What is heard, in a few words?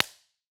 ampeg combo; IRs